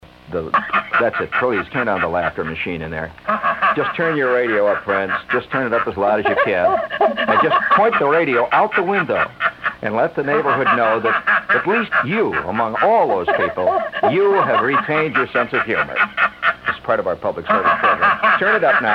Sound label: Laugh Record